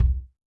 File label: Ludwig Kick, Drums; PX7; Digital Sound Factory Protean Drums